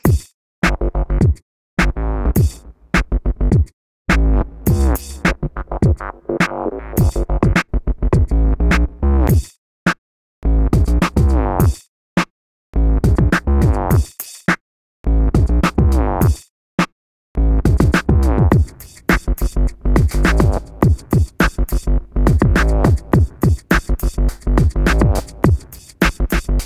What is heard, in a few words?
BoomBox loop